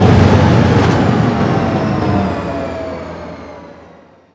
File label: gdi apc stop